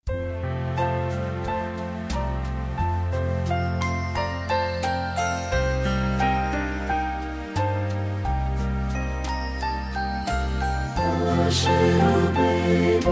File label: mockingbird SATB christmas